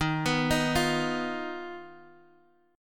D sharp Suspended 2nd Sharp 5th, Guitar, Half Step Down